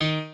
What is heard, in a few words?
piano